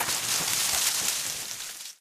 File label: foliage, footsteps, survivor, run; player; source engine; left 4 dead 2